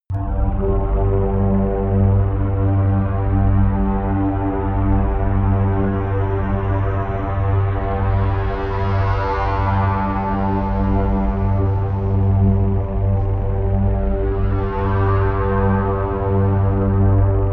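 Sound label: monotonous string, pads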